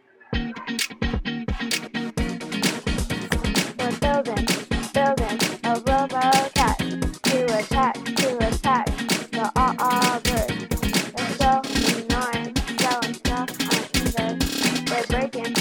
attack Ah Ah Birds vocals

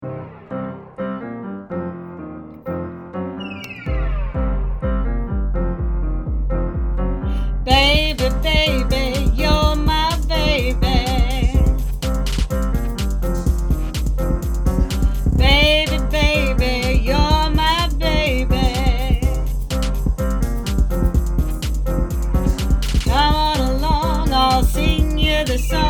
Baby Baby vocals

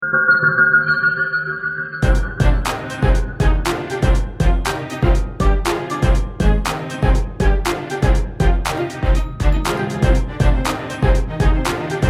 Instrumental

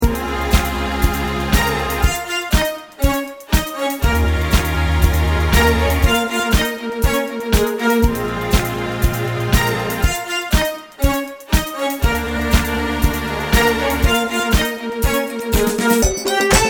Instrumental

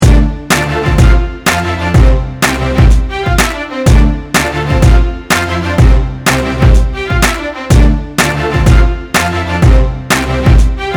song instrumental

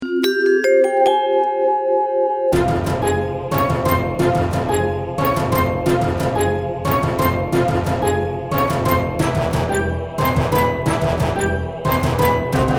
instrumental

